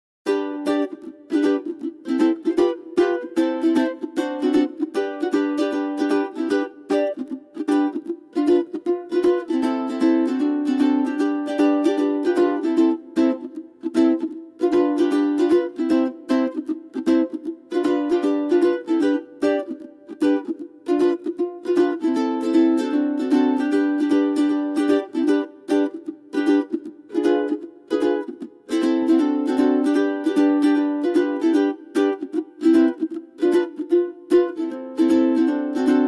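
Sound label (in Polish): Muzyka Hawajska; Mele Hawaii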